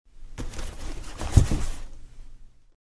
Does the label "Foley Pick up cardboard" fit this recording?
Yes